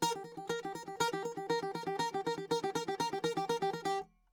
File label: bouzouki